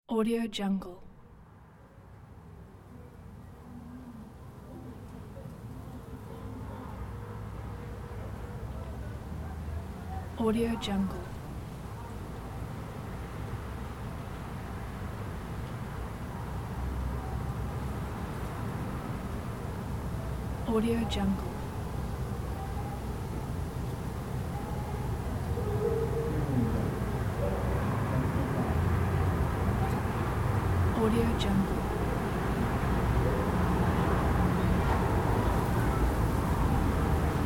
street ambience

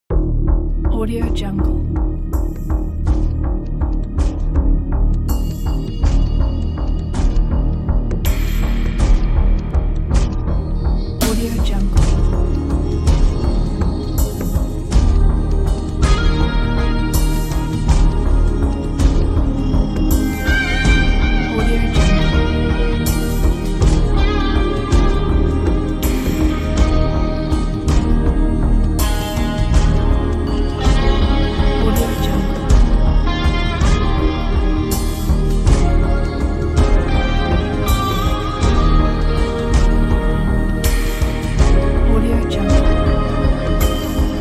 cinematic indie rock ambience

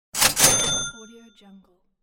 cash register

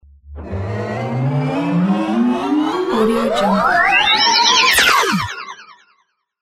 ufo flies away

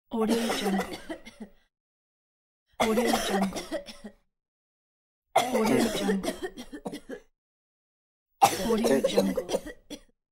woman and child coughs

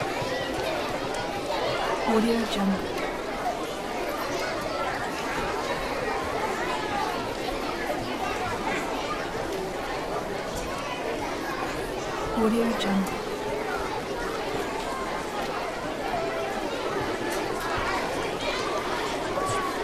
children playing sound

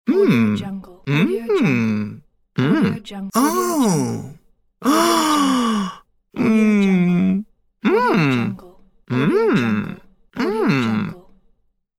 male voice expressions surprise

